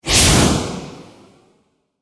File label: Rocket Launch, Warfare, Away; Mortar; Guns; BlastWave